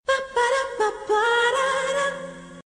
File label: sms; nescafe